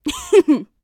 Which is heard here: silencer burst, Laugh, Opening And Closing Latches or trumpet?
Laugh